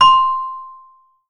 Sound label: E Mu Proteus 2 Celesta C6